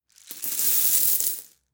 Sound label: Coins Emptying Hand